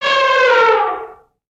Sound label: Pets Elephant Happy; creatures pets; Lego